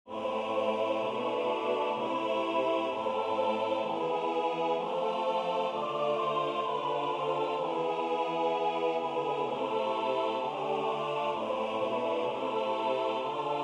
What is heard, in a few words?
o lead my blindness SATB; engels; O leid mijn blindheid